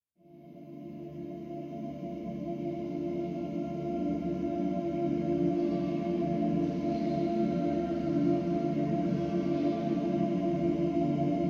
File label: Ambience (Dark Calm); legrand legacy tale of the fatebounds; Scatia